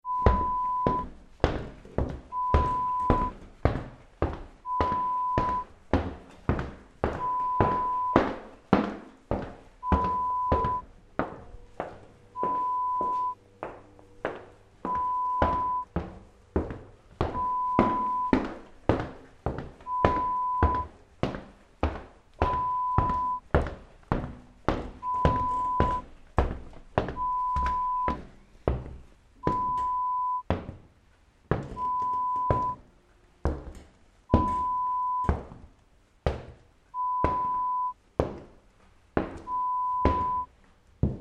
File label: Footsteps CORRIDOR